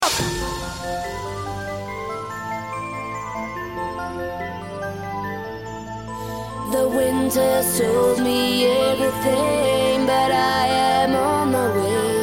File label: trance; quake